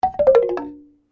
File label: GAMALAN BAMBOO STRUM DOWN, Indonesian Gamalan copy; Musical